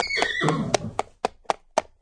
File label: Pony Knock Hoofs